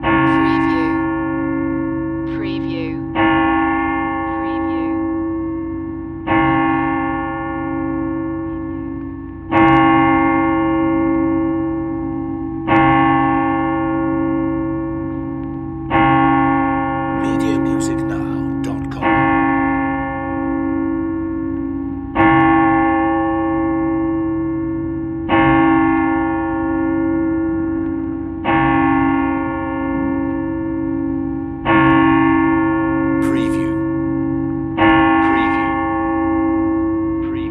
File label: Church Bell Single Continuous